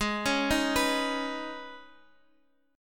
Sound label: Eb 7th Suspended 4th Sharp 5th Ab